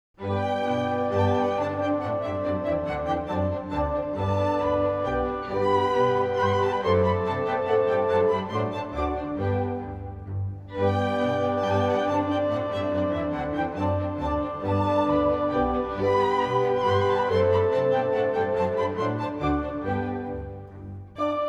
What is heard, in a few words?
german dance in g